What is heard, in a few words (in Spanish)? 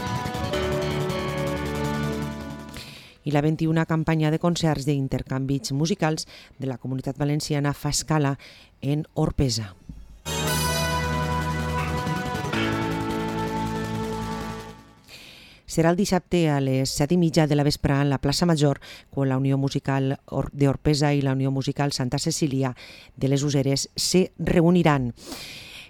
BANDA MUSICA; OROPESA; intercanvis musicals de la comunitat valenciana fa escala a orpesa